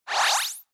Space SciFi Robot Zap Spin Down Spaceship Transformer; Sound Design; Tech Sound Effects